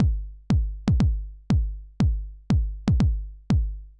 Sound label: kick